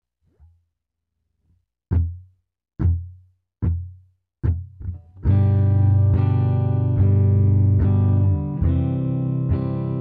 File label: low e, guitar